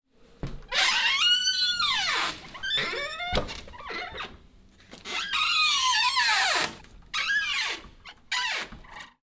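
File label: door creak; skrip dveri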